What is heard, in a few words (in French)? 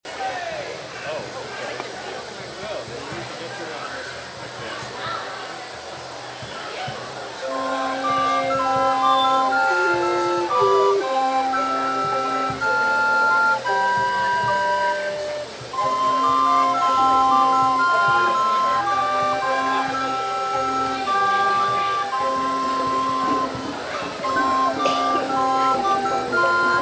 flutes a bec